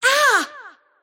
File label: kid pain; Invoker